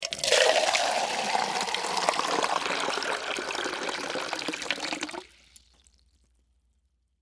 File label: Water Pour Metal Pot, From, Metal, Flower, Pot, Into, Plastic, Container, Long; Pouring; BlastWave